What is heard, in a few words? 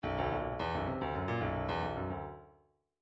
bdf midi file grooves funk e funk e